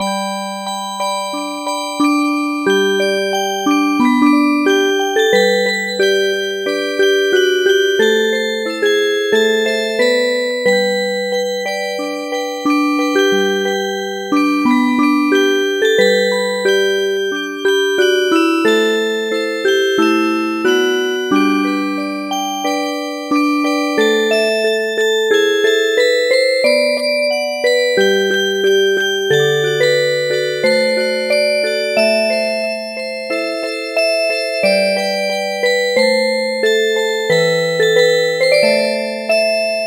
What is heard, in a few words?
Music Box